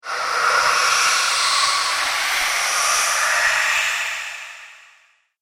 Lair Of The Vampires, Scary Soundscape Sound Effects